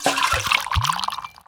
Pitcher Underlay Attack

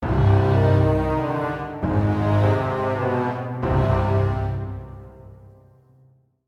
Legendary Stinger

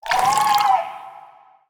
Water Droplet

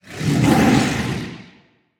Astral Tiger Attack

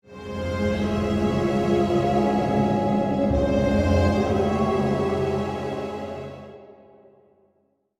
Reliquary Play Stinger

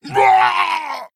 Male Orc Death

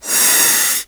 Play Snake

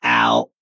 male mech death

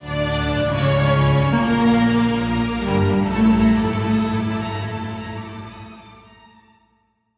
Stinger

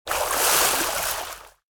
Water Attack

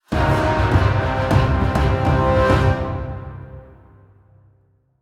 Stinger; Raid Boss Onyxia

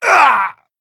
Male Satyr Death

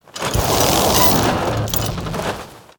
Hot Air Balloon Play

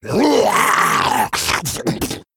Male Murloc Attack

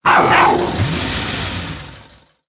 Vicious Scraphound Attack

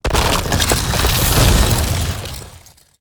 Manticron Cubes Death

